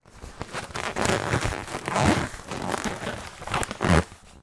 foley balloon foil inflated handling squeak creaks